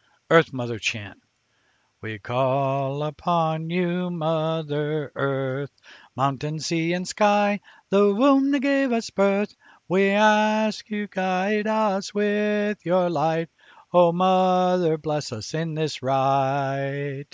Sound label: Earth Mother Chant